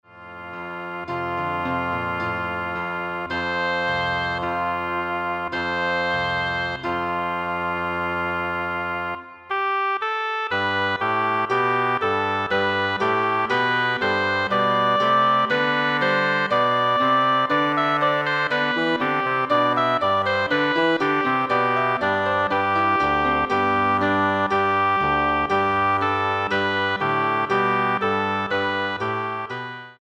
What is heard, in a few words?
Oboe Bassoon